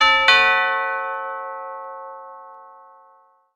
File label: Gong